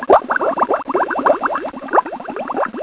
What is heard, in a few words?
Water, 自然, 水声; 特酷声音素材库